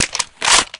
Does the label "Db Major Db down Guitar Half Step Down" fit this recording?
No